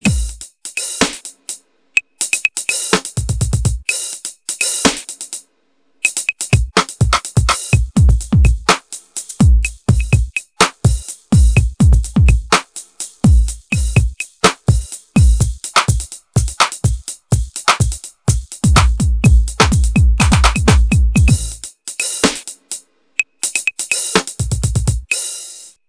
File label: motif6 pat flip hop